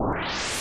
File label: Bottle rocket; mother2